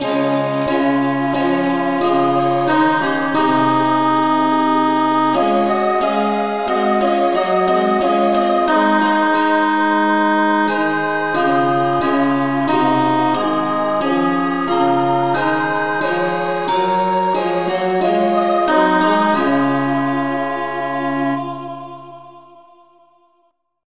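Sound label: rev no vocal key D flat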